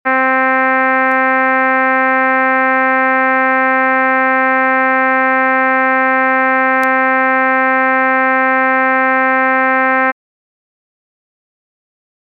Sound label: Composite Trumpets